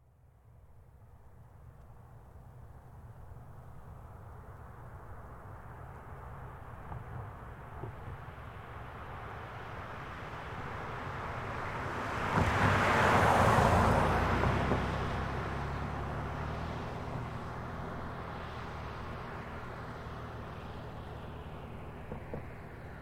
Car Highlander By Slow; 2005 Toyota Highlander V6; Cars; Vehicles; Big Room Sound

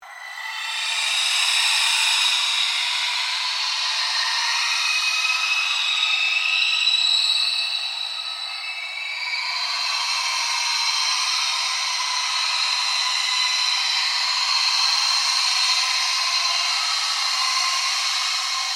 Ambient Drone Ghost Chatter Scream; Scary Soundscape; Sound effects created by manipulating other sounds

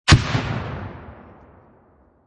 M67 HE Grenade; Grenades; Explosions; VRSonic

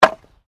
Wood Drop; Material components for constructing more complex sounds; Materials

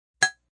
Clink, Metallic; Metallic Sound Effects

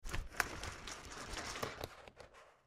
Household Blinds Horizontal Pull Up Slow; Windows

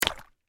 Water Balloons Hit, Together; Cartoons; BlastWave

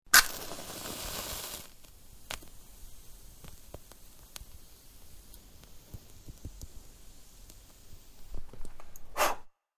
Striking a match; Flames; Match Strike; Objects

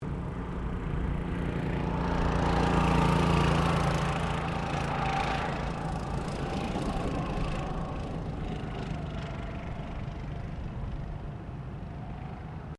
GOCART PASSING, Gocart track; Gocart track in Queensland; Go-Carts; Vehicles

